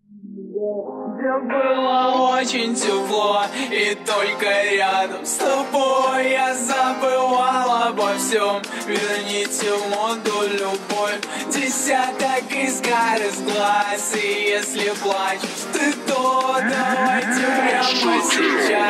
hardstyle slowed

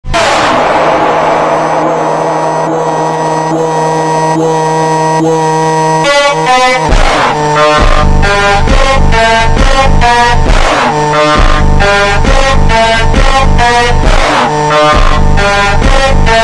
funk ultra slowed reverb

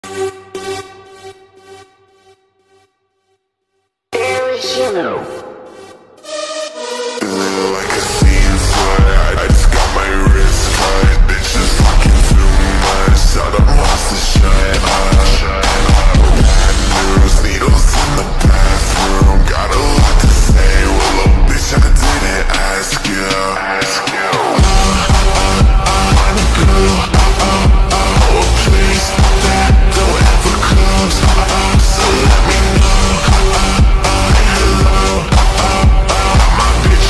slowed reverb

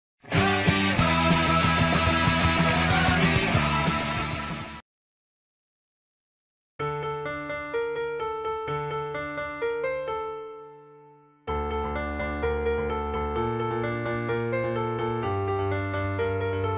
easy piano; murder drones episode 8

